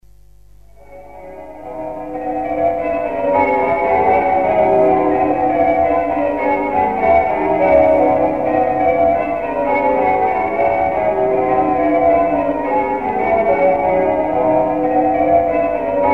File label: Bow Bells; Falklands service announcement